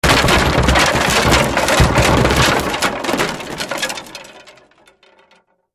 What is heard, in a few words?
Crash Wood Objects Debris; Wood (Sound Effects:Materials); Blastwave